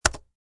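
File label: mechanical keyboard; QCHT Unity Interactions; XRKeyboard; Poke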